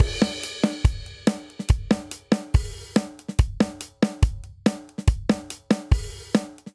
Syncopated Folk Verse Variant 142 bpm; MIDI Clips; Ableton; Factory Packs; Drum Booth

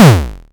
modular deep, Kickbank; mpk mini presets; Ableton Foundation FreePacks

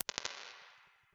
Space Repeat, Convolution Reverb IRs; Strange Places

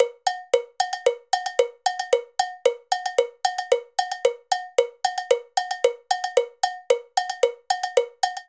Calypso A Cowbell 113bpm; Latin Percussion; Ableton; Factory Packs

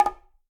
Bongo Flam; Bongo A H Flam 1F; Latin Percussion; Drums; Drum Hits; Ableton; Factory Packs

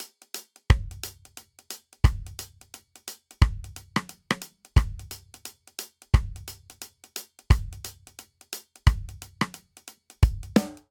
Swing Reggae Verse Variant 88 bpm; 4 4; MIDI Clips; Ableton; Factory Packs; Drum Booth

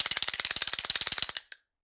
Castanet A Roll; Latin Percussion; Drum Hits; Wood; Ableton; Factory Packs

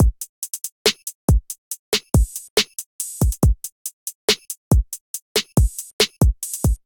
Kit, Trap Drums; Tempest Kit; Trap Drums by Sound Oracle; Ableton; Factory Packs

